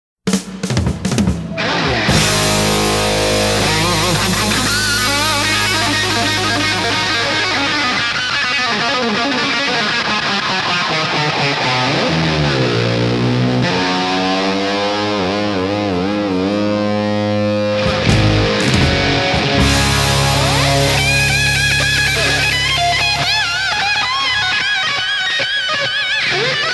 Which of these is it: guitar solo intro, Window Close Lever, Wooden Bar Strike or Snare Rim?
guitar solo intro